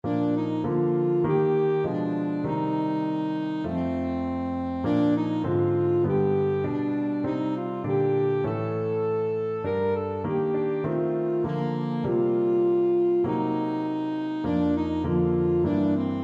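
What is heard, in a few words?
alto saxophone; love came down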